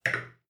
Champagne Glass Set Down

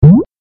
jumping; mike mario

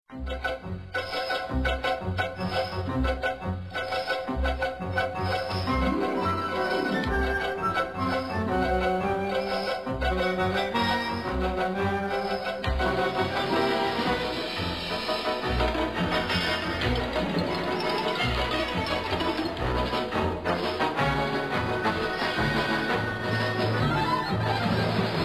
television closing theme

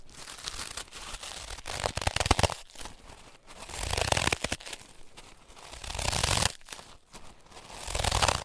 walking in snow

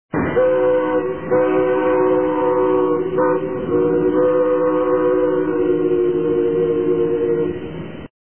air whistle

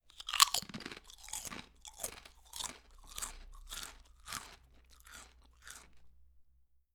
Potato Chip Crunching

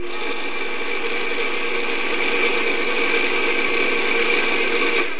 household

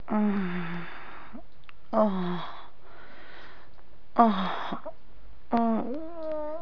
people

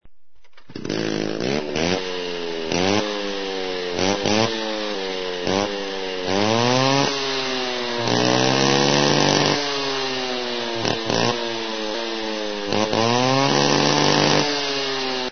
CHAINSAW

